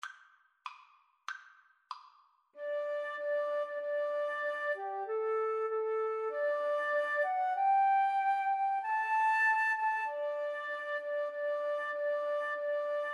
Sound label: Flute duet